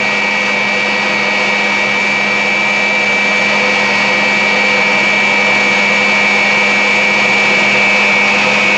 F86 Constant Engine; sabre

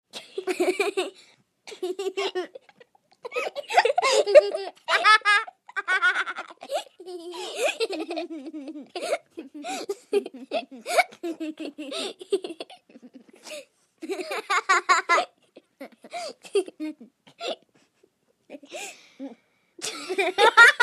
6 Yr Old Girls Gigglin

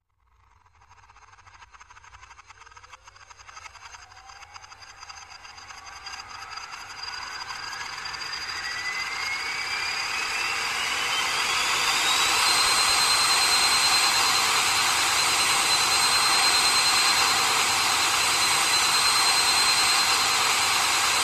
Nutron Engine, drones & tones

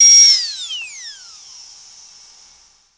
Drill Dentist Off and Wind Down

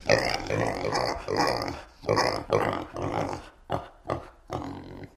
Pig Screeches

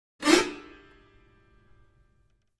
Piano Strings, keyboards piano

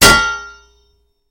Sword Clanks Heavy Si; swords

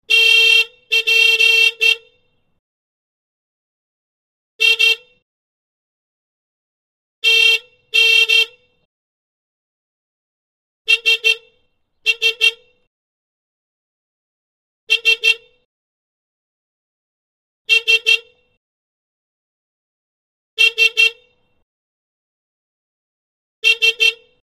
Citroen Deux Chevaux, Horn Blasts.

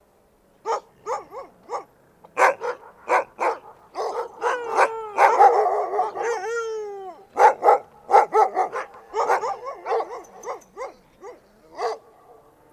Dog Barks Howls, dog

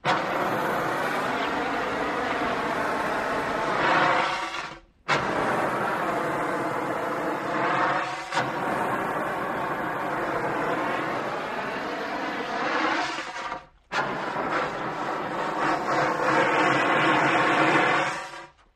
Extinguisher Blasts; fire extinguisher

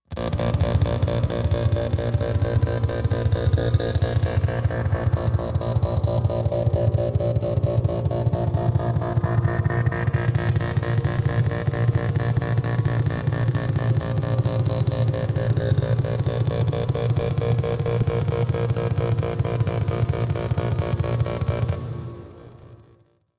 Fusion Warning Machin, alarms

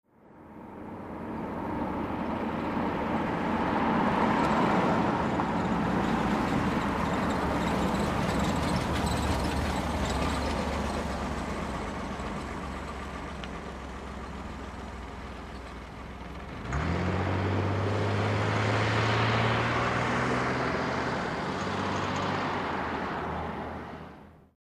Chevy Corvair Medium

